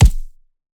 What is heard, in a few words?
Punching Perc, Straight Glove; 99Sounds; Punching Percussion